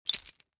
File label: save